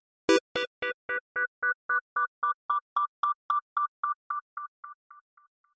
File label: Dub Fx